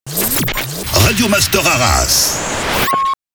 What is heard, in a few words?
Sweeper SPEED; Radio Master Arras; Habillage